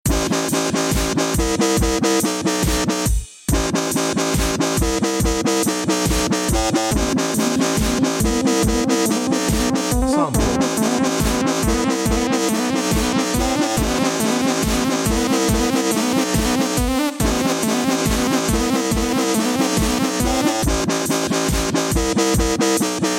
dubstep, backing

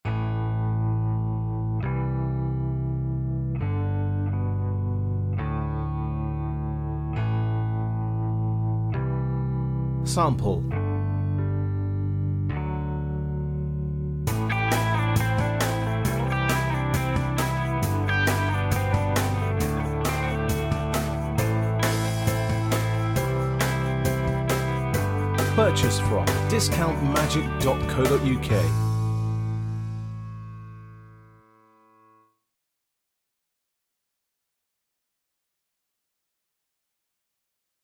beat

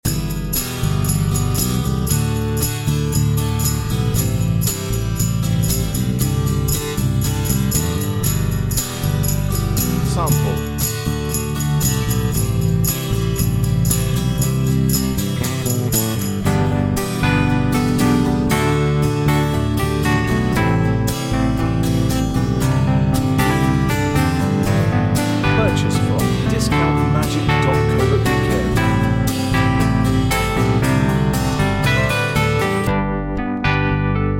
acoustic, backing

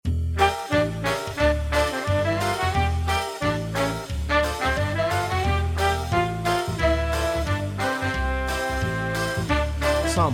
beat

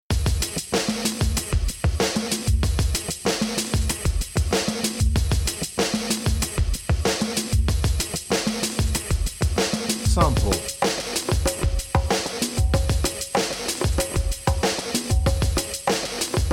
hard hip hop

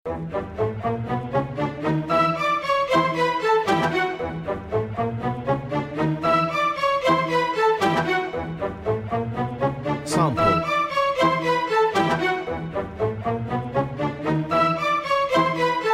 classical

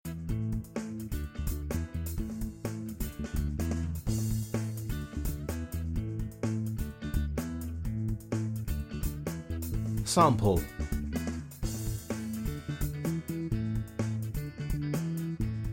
Upbeat Groove

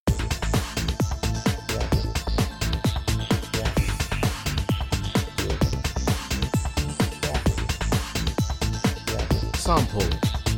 electronic

